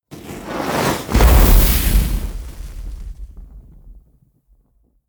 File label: burst